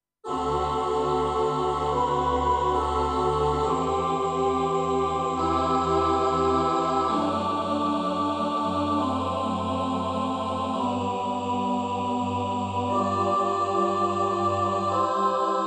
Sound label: Choir Aahs; 24. Mellow Choir; I SUONI - GLI STRUMENTI XG; clavinova clp270